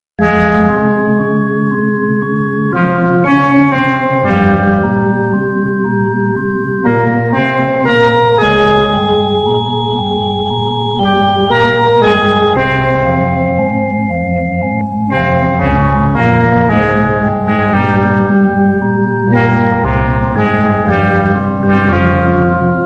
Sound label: iphone detection sms ringtone